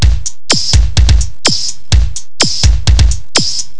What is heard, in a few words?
techno